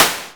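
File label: snare drum, Simmons SDS8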